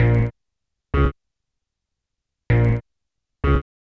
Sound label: Horn